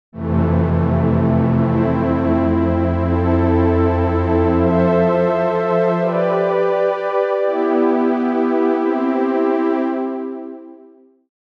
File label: Lo Strings, Jupiter8; OPXPROII; opxpro2